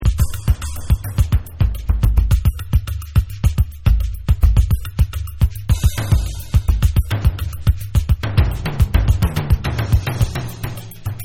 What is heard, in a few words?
Bonus Beat